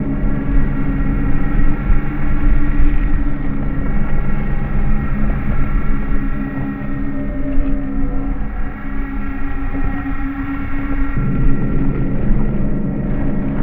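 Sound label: lithoid ambient cruiser hum; Stellaris; lithoids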